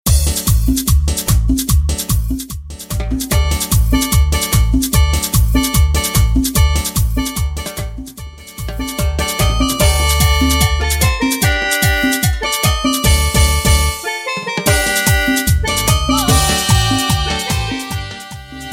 Intro Melody, 148 Bpm

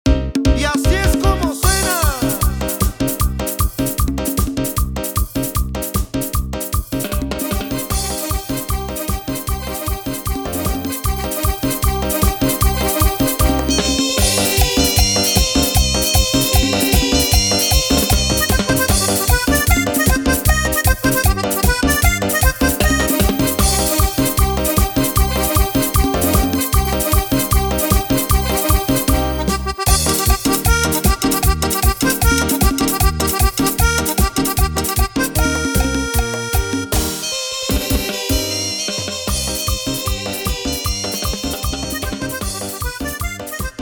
Instrumental, 150 BPM

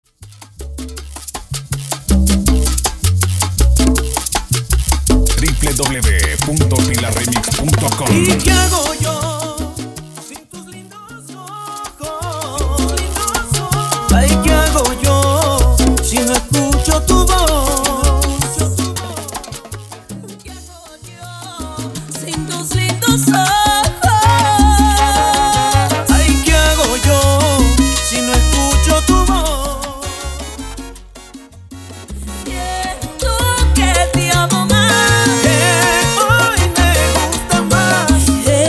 Intro Acapela, 80 Bpm

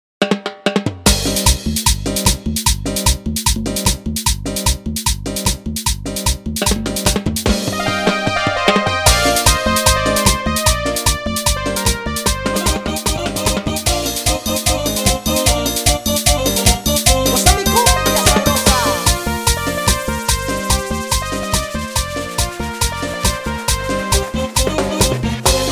Cambio De Ritmo 150 to 119

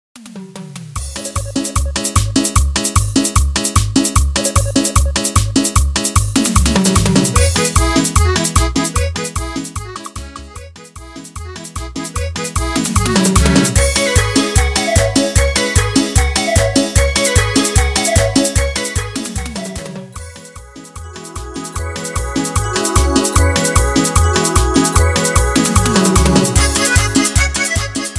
Instrumental Chichero, Bpm 150; Dimax Dj Mix

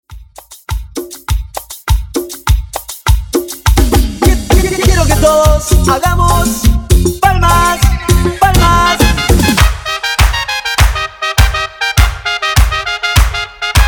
Intro Outro Reload Cumbia, Bpm 101

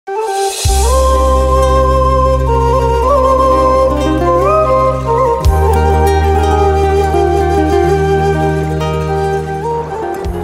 Intro Melody, 117 Bpm

